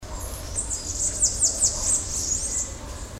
cambacica, aves

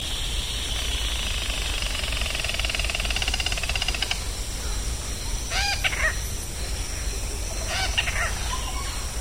alma-de-gato, aves

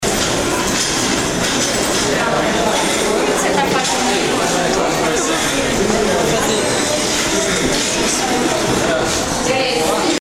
hospital

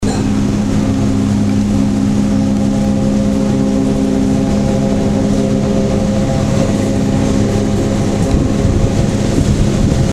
caldeiras

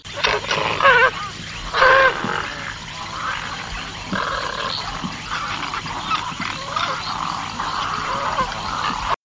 garca-grande-branca, aves

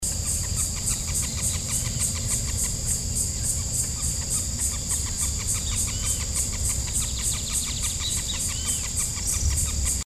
jacana, aves

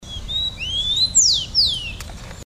tico-tico, aves

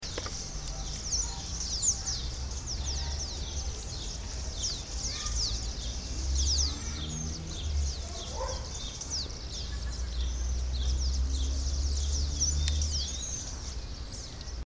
andorinha pequena de casa, aves

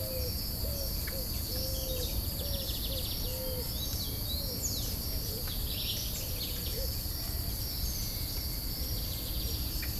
pombao, aves

